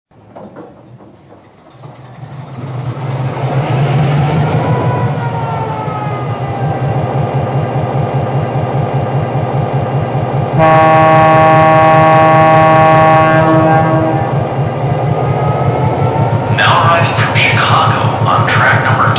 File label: EMD F-3